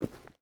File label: footstep rubber boots forest walk; Rust